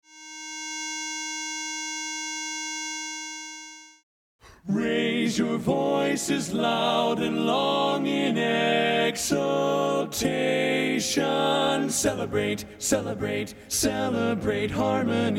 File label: Celebrate Harmony Lead